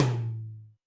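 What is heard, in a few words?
Alesis D4 Tom Ambient Hi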